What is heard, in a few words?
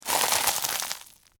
Texture, Crunch, Oyster, Crackers; Crunches; Objects; BlastWave